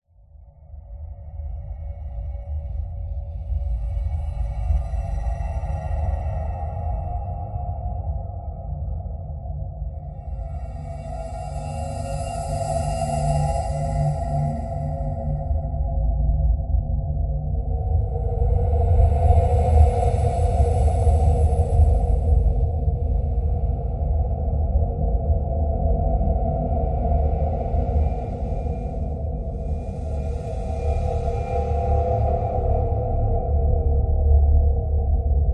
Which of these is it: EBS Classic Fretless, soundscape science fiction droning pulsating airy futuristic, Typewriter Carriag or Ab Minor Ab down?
soundscape science fiction droning pulsating airy futuristic